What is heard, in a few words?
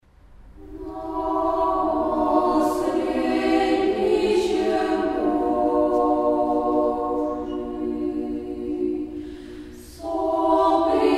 children choir